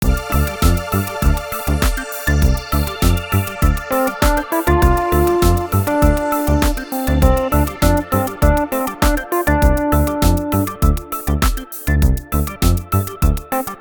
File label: Thriller 100 bpm